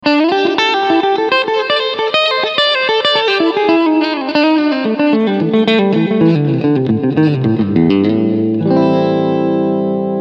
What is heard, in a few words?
Preset Room